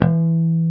Bass Harmonic E1; Proteus 2000; Digital Sound Factory Proteus 2000

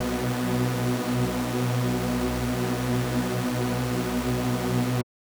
SuperSaw B3; SuperSaw1; KG MegaSaur; M8

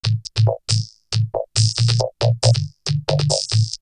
Vocoder Loop; X-Static Goldmine; VocoderLoops

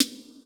RIM; Xsample VOL-9

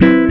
GTR CHORD; Univers Sons; Jazzistic

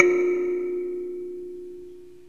AFRICAN INST; OMI - Universe of Sounds

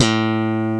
ELECTRC BASS; OMI - Universe of Sounds

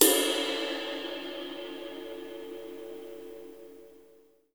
Ride, Live Kit; Sonic Foundry (Sony Creative Software); Ugly Remnants VOL-2; Single Shots; Beatstuff

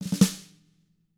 RUFF, SNARE; SNARE ROLLS; ILIO; Double Platinum Drums 1

